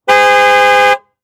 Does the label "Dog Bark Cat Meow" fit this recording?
No